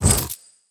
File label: chest pickup, inventory; ui